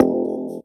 Alien Warning; FX Hit; Drums; Ableton Live 12 Lite; Core Library

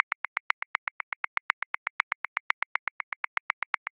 Perc Bongo Accent 16ths; Grooves; Percussion; Ableton Live 12 Lite; Core Library

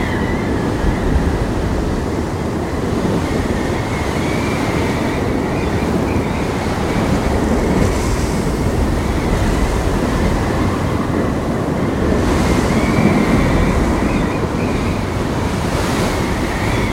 Mountains Gale Wind Loop Quad; Journey

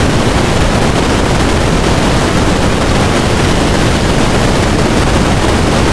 Noise Pouring Rain; Ableton Live 12 Lite; Vector; Sprites

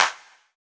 Clap, One Shots Drums; Ableton Live 12 Lite; Core Library

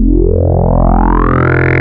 Vector Sprite Basics FM Fold; Ableton Live 12 Lite; Sprites

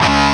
Boss Theme; MMX; X2

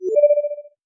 Alert

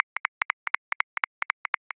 2 Step Shuffled 16ths, Grooves; Ableton Live 12 Lite; Core Library

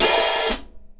Hihat Open

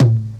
Tom Mid, One Shots Drums

